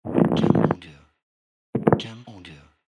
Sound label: Brick Dragging